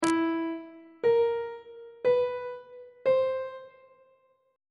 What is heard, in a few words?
e dim5 perf5 aug5 intervals on treble clef